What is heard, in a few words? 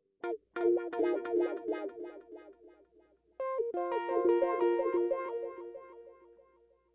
Guitar, Funk